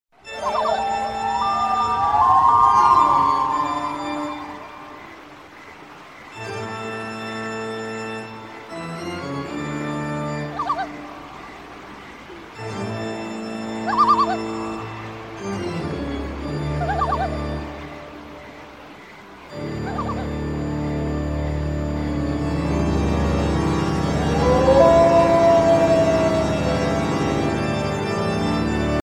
haunted house ringtone

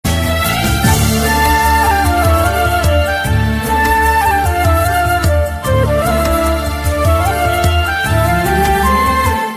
malayalam flute ringtone

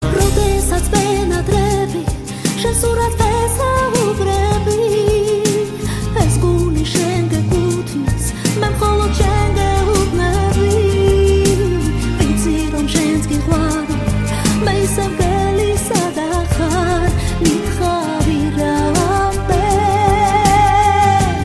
georgian love hits ringtone

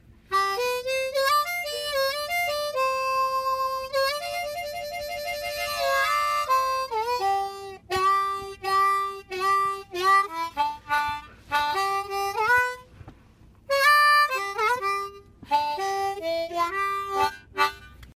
harmonica riff ringtone

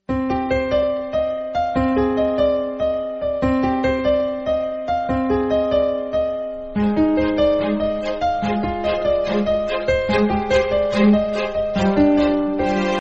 nokia 3 android mobile ringtones

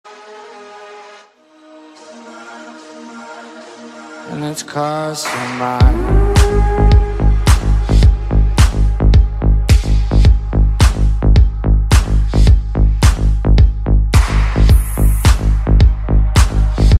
samsung ringtone

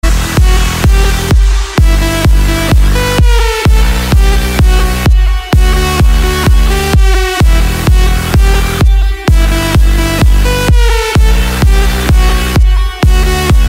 electro ringtone; apple iphone x

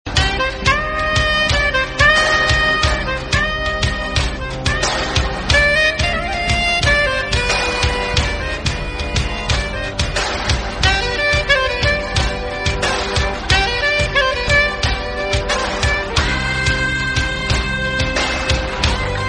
kannada tune

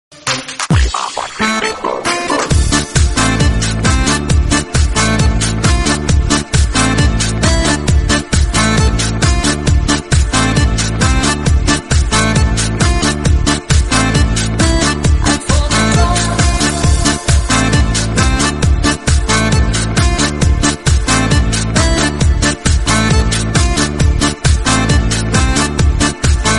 italian music tune ringtone

